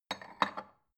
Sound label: bowl shelve soft